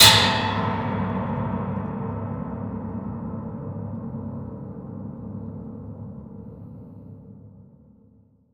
string strike; Cinematic Metal Impacts

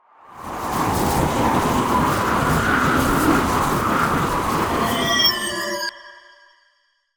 Ice Spell Freezing Slowing; Frostbound Curse; Arctic Aura

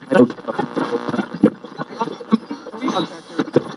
AM Radio Tune; Communications; 1001 Sound Effects

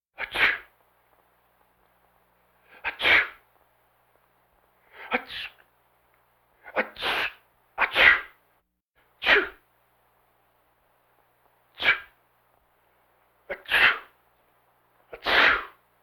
Vocal effects breathing Moaning Baby

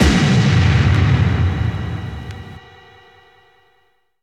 POW; XXL 800 Production Effects

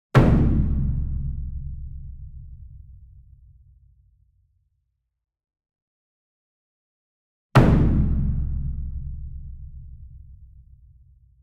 SINGLE DRUM Gong Hit Hard Beater Medium; boom library; Cinematic Strikes Construction Kit

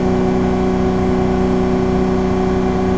Chrysler 300M Loop, Automobiles

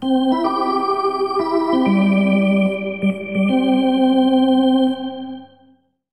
Hockey Organ

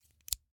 Scissors, snap, snip, shear, small med, single; Eiravaein Works; Vaeyan IV

